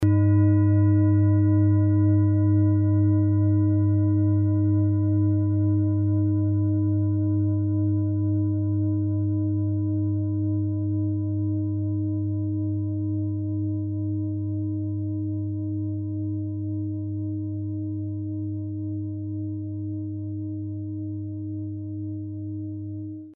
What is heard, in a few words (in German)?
klangschale ladakh; Die Klangschale kommt aus einer Schmiede